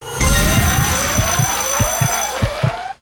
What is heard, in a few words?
mega win; Beauty Fairy